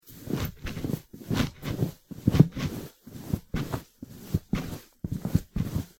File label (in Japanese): 布ソファの上を歩く音; sofa